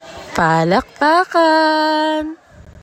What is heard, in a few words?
clap; journey